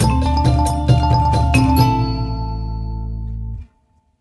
Pinball (Transition); Cooking Dash; iOS, mobile

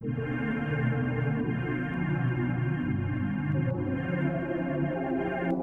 Ambient

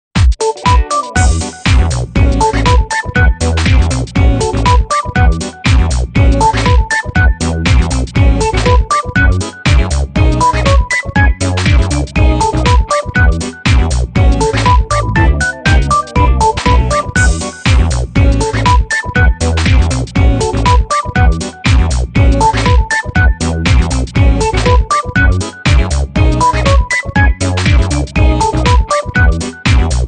Music Success event

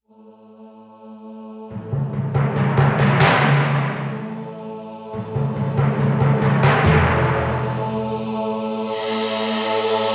TENSION LOOPABLE

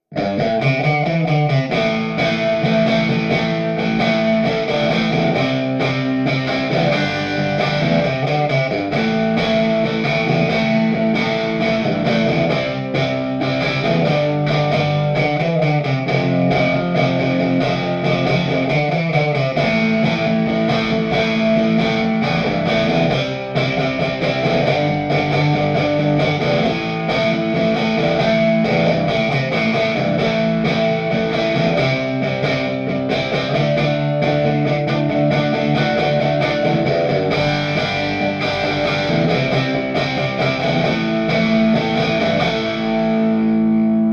subway play for tips, eguit; The Sims 3; windows gamerip 2009

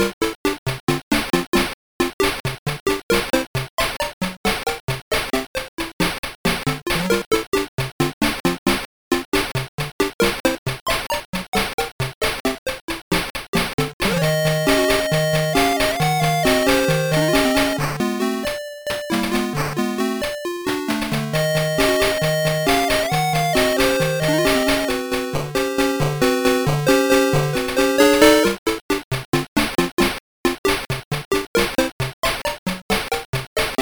Jungle; deep duck trouble; master system

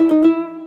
Mariachi Guitar Solo Short; gamerip; WrestleQuest

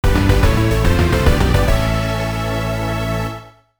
Match Start; Mario Tennis: Power Tour Enhanced Version; GBA

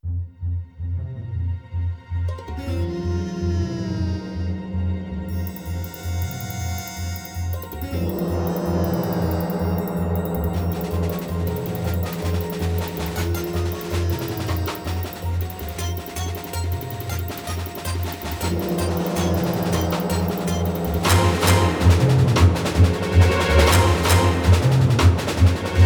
Action

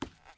wood step; teardown; ps5 windows xbox series xs

